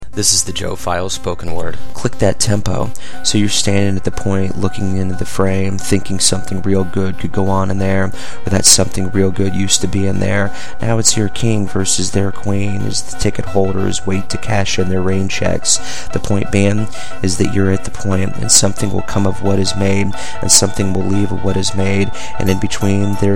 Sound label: click that tempo